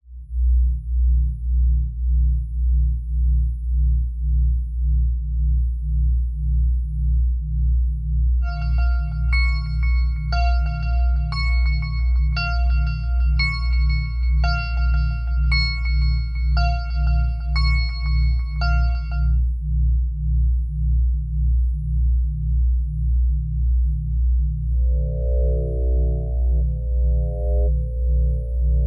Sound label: Alarm Scenario; DECO 1013 Sound Design and Sonification Assignment 1. 1984